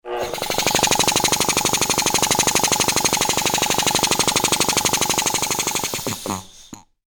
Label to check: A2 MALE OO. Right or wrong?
Wrong